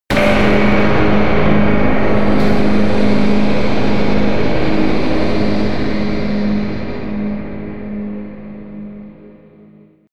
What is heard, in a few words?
crush hit